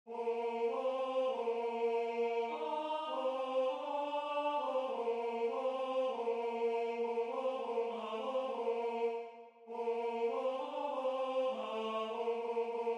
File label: tenor; ah plaignez tant